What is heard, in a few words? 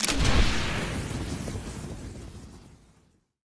action smokescreen